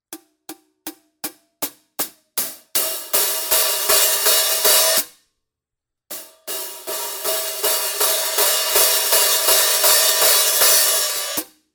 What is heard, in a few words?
14 I Trash HH close to open, cymbal sound